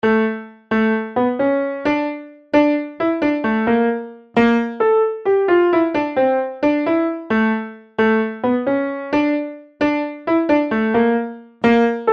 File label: lh piano riff